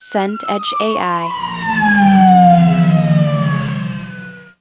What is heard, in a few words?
siren fire engine